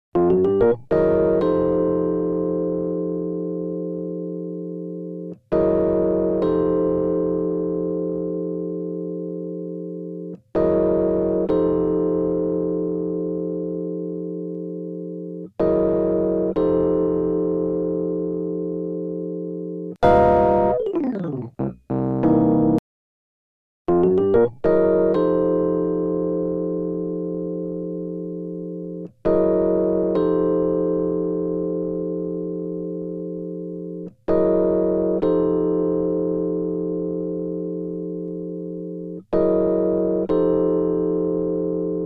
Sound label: Rhodes dyn